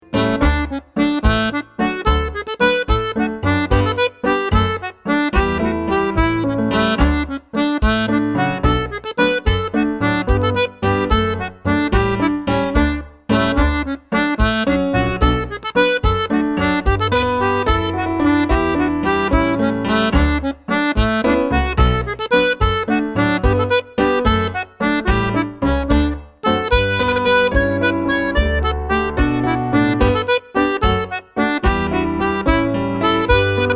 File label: Treble Jig; Irish Dance